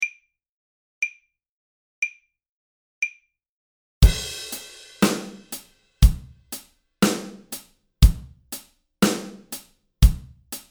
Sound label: Groove 60 bpm